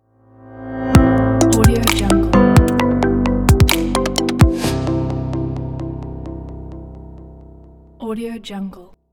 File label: digital piano logo